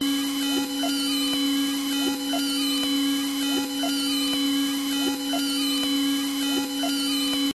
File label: Hybrid Electro Magnetic LOOP; PMSFX